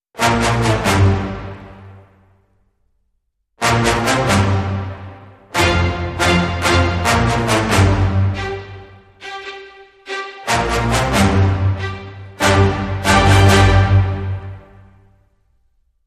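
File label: Hybrid Sting, Orchestra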